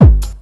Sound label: hard beats 140, hard trance beats